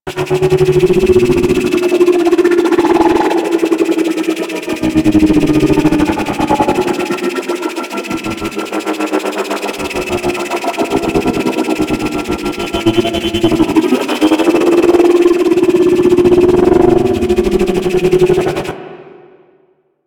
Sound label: Gas City Projector Drone Loop; Warframe; Corpus